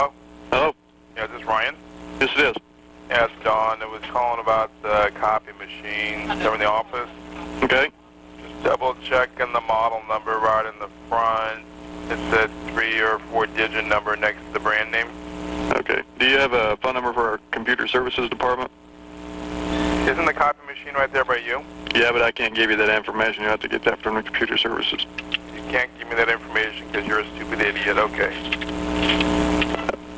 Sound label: copy machine call